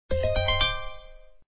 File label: Acoustic world; Sony Ericsson